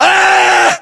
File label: melee attack; english; voiceovers